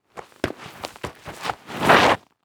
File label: Foley Footstep Golf Cleats Shuffle On Dirt; Foley Footsteps